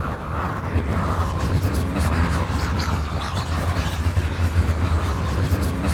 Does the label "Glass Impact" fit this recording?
No